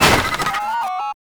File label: Jammer Destroy; Gameplay; Warframe; Nineteeen Ninety Nine; PvPvE